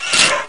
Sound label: slimer die; dn3d r